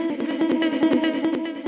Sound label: afro stutter, vocal or voxy loops